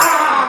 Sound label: soft hitwhistle; osu!